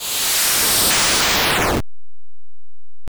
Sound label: Explosion